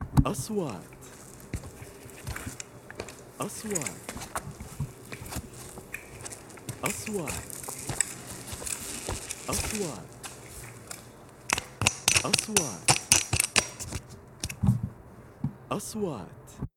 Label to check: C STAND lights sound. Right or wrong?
Right